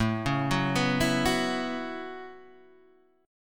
A 6th Add 9th A down Guitar Open D

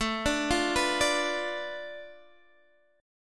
B Minor 7th Flat 5th A

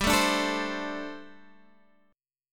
G Suspended 2nd Suspended 4th; Gsus2sus4; strummed; 15,12,12,14,13,x

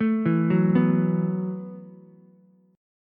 B 7th Suspended 4th B down Ukulele; B7sus4 arpeggiated; chord shape; JGuitar

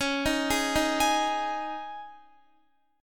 C sharp Suspended 2nd, C sharp, Guitar; C#sus2; arpeggiated; x,16,13,13,15,15

